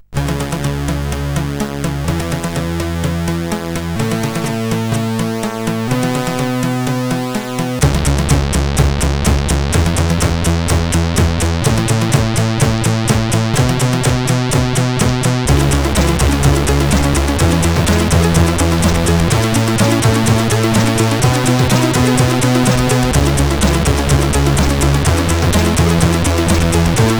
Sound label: CSG8580; sid CSG8580R5; Great Commandment